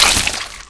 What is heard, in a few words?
splat; bugbait; weapons; hl2